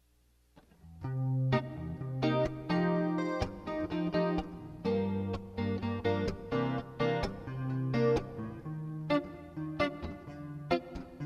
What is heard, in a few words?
Sad Samba